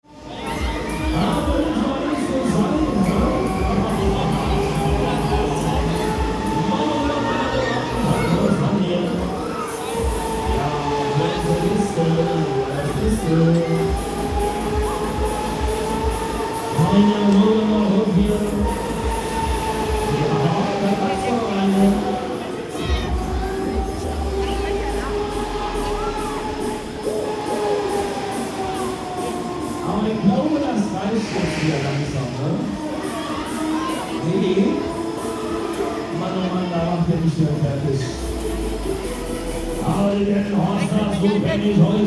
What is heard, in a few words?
funfair german rollercoaster